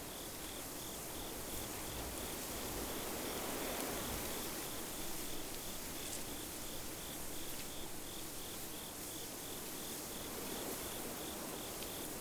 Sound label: outdoor wheatfield dusk insects